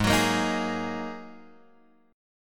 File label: G sharp 7th Suspended 2nd, Guitar, Drop D; 6,9,8,8,9,x; down; chord shape